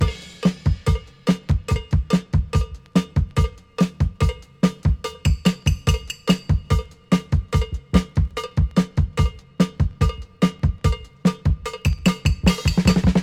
145 bpm hq drum loop sample b key, Free drum loop - kick tuned to the B note